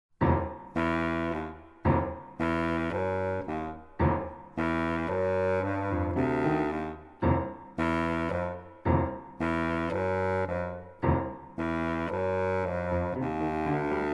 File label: Music for Contrabassoon and Piano